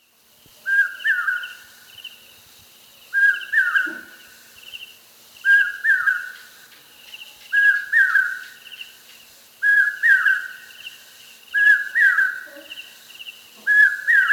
hawk cuckoo

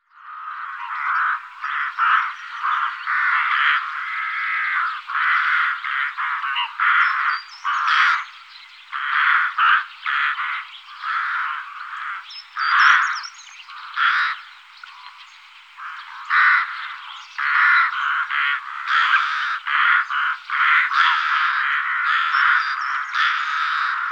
rook

